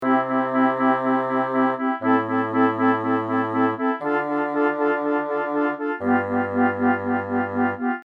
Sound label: woodwind adjusted; masaakiwada